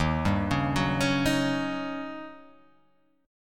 Eb 7th Sharp 9th, Eb7#9 chord; Eb7#9 arpeggiated; JGuitar

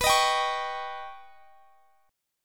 Eb Augmented 7th

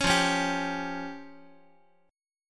F Suspended 2nd Sharp 5th F down Guitar; Fsus2#5 strummed; JGuitar

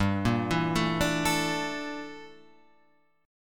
G Minor 6th Add 9th, Guitar, Open F; arpeggiated; 7,5,4,4,5,4; JGuitar

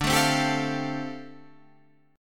F 6th Add 9th D, chord

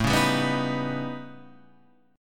A 9th Flat 5th Guitar Half Step Down, chord; A9b5

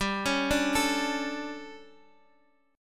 D Major 7th Suspended 4th G

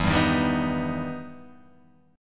E Minor Major 7th E down

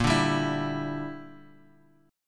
Bb 6th Flat 5th Bb, Bass Standard; Bb6b5 strummed

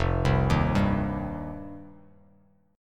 F 7th Suspended 2nd; F7sus2; arpeggiated; JGuitar

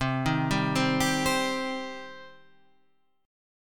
C Minor C; Cm Chord {8 6 5 5 8 8} arpeggiated

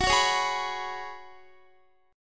Gb Suspended 2nd Suspended 4th, Guitar; strummed

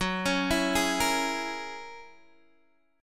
F sharp 9th, Guitar DADGAD

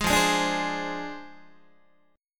F Minor 6th F down Guitar; Fm6; strummed; 11,13,11,12,12,11; JGuitar

